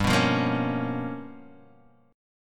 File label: G 7th Sharp 9th Flat 5th G, Guitar Open D; 5,2,3,4,4,x; down